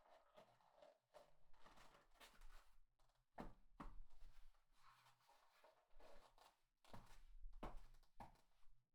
パンプスを履く; KU100; 色々な動作

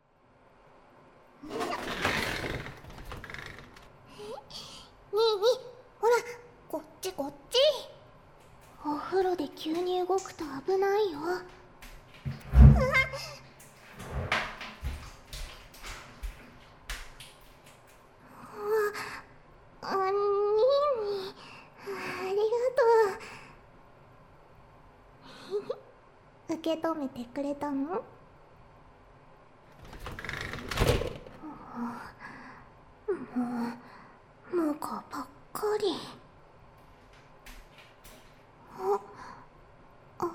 お風呂であわあわマッサージ; 反転

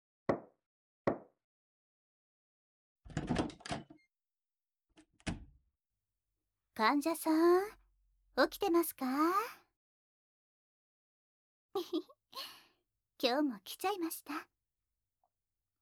耳舐め, ASMR; 媚薬; 射精

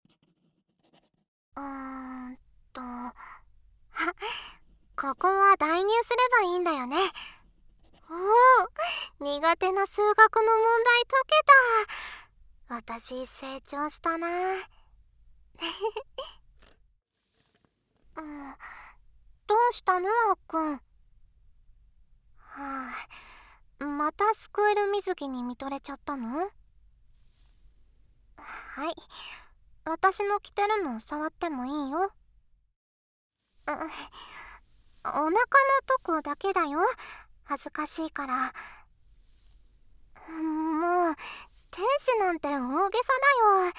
左 吐息 ver スク水 触る ノイズ除去なし

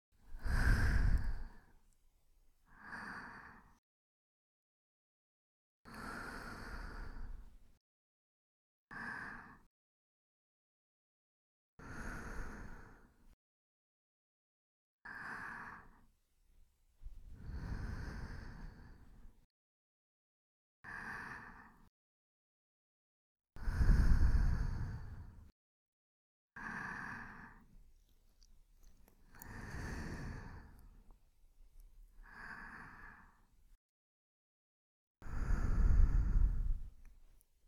寝息ループ; 寝ている間にツンデレ彼女に夜這いされちゃう音声

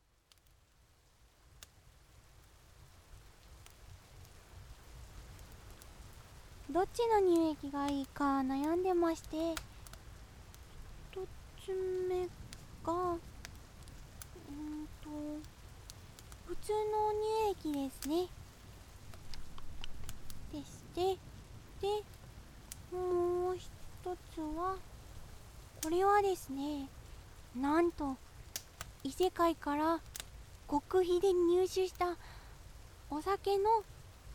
乳液でお耳をマッサージ, ASMR

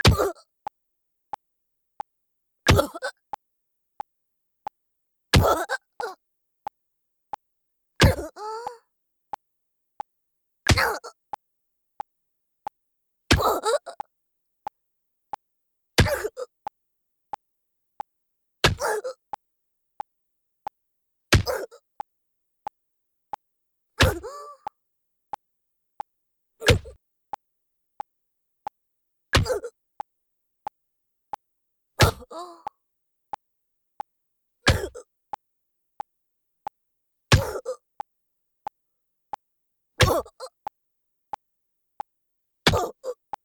メトロノーム BPM90; 腹アッパーメト BPM90 ワン; メトロノームあり

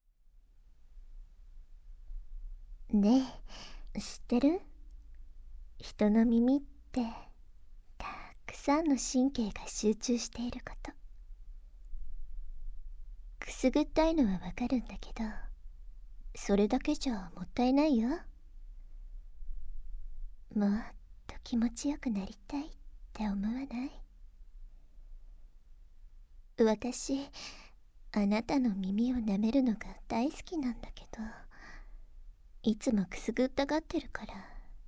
舌を使った優しいお耳慣らし (お耳ふーふー 耳のふち舐め 耳介舐め), ASMR

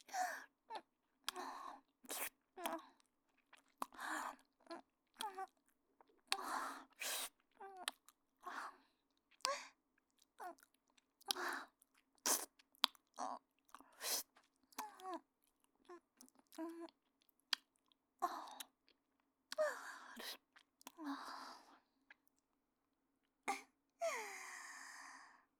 耳舐め系

